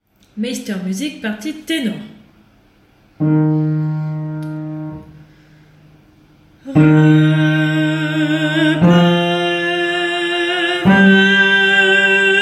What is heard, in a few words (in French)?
tenor; Meistermusik